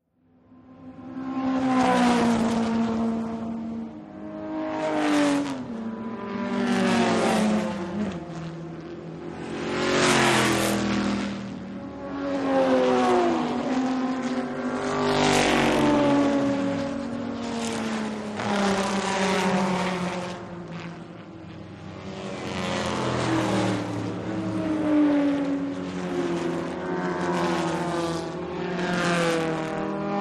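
Vintage Race Car; By ( Several ), Vintage Race Cars close up Fast Bys R / L, Shot close up From The Track; 1914-1954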